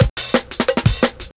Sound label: Bell 175, Drum&Bass; EZ Rollers